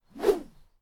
Bamboo Swing

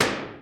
Short Gunshot

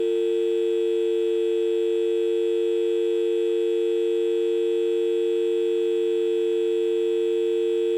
Dialing tone

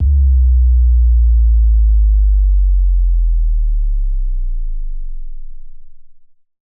Bass Drop

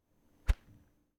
Knife Swish

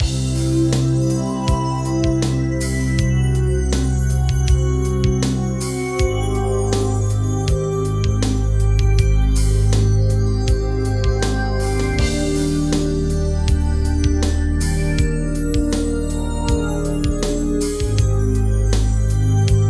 Loop Alternative

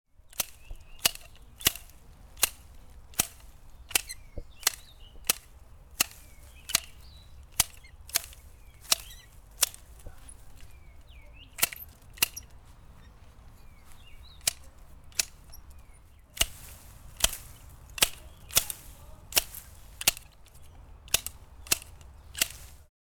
Hedge Cut

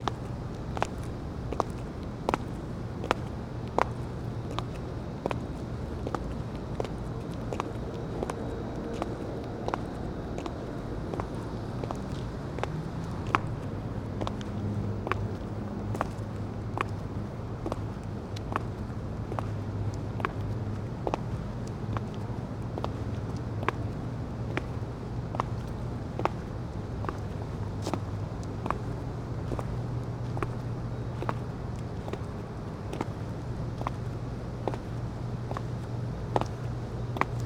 Footsteps Outside Heels City Sidewalk Walking

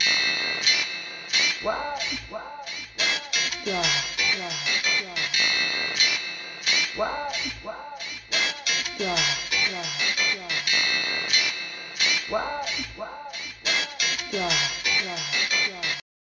Scary Vocals

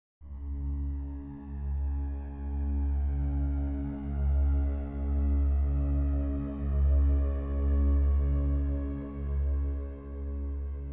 Simple drone

